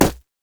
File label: blue minion melee basic attack; LoL